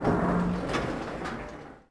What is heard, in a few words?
spaceship fortify; Sid Meier's Civilization 4 Complete; Beyond the Sword; Final Frontier; Units